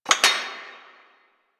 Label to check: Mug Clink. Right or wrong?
Right